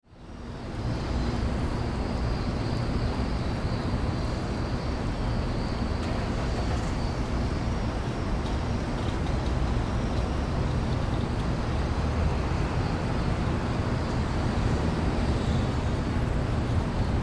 Ambience City Alley; Cities; Backgrounds

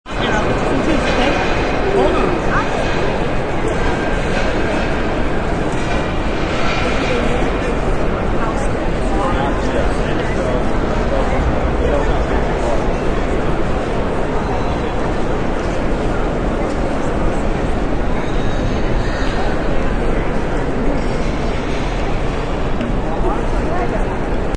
Crowds chatter and loiter in busy train station; Train Station Background; Train Stations

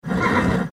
Horse Neigh; Horse Neigh Huff; Horse Sound Effects; Animal

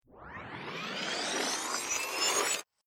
Riser Reversed Glass Breaking Pitched Speed Up; Sound Design; Rise And Dive Sound Effects

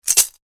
money piggy bank shake; Piggy Bank With Coins Inside Being Shaken; Objects

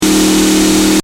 Engine: High Revs Loop, car

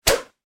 Whip Harsh Fast; Foley; Sound Effects:Objects

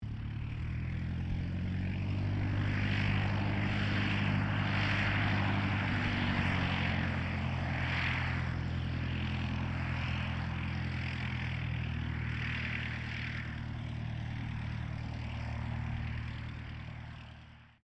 AIRCRAFT RYAN STM-S2 TAXI; 1939 Ryan STM; Airplane; Airplanes; Vehicles

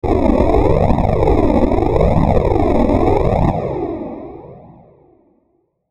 static flange; Static Flange Filter Warble; Production Element Imaging Element Accent Transition; Blastwave

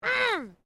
FEMALE OUCH; Female vocal sounds; Pain (Sound Effects:Voice)

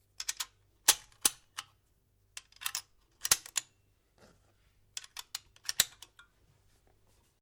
RIFLE REMMINGTON700 7.62MM LOAD ROUNDS; A selection of domesttic firearms; Rifles; Guns

